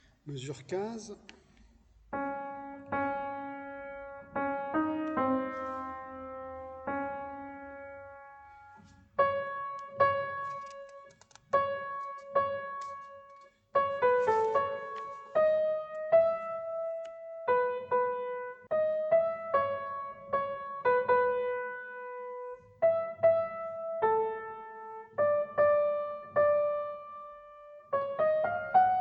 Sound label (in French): sanctus soprano; choeur transfrontalier